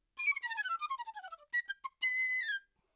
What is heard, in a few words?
Flute, RNR for piano